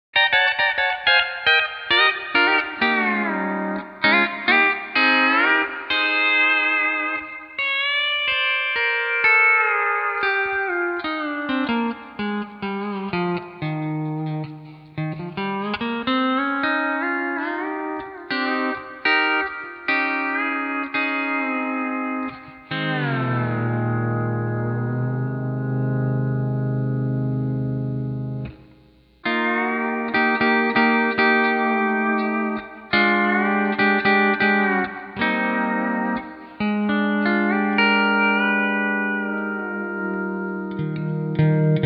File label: Twin Factory With Room Reverb Cranked Up, D13 Copedant; D13 Copedant Tabs